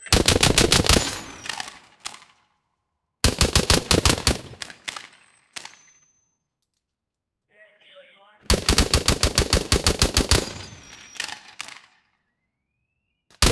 machine gun realistic, gunfire

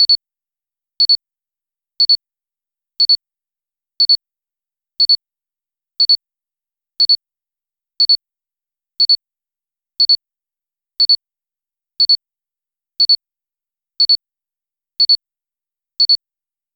bomb countdown; 30 second; fps

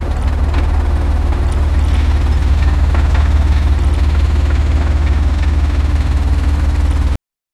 boat rigging with feet on deck

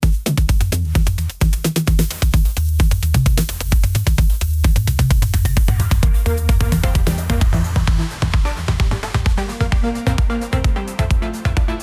finance video bgm inspirin

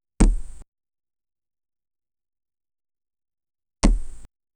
push object; object manipulation

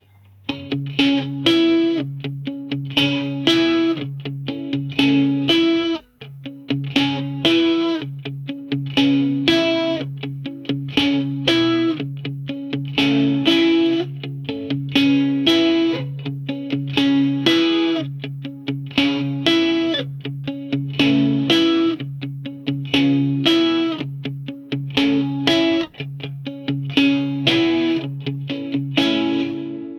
soft rock emotinal guitar, 120 bpm

